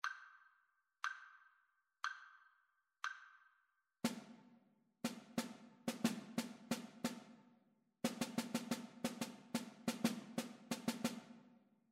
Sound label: rhythmic dictation